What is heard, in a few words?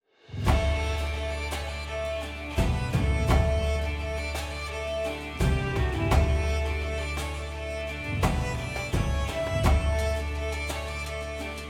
Low Key Positive Track; Crusader Kings III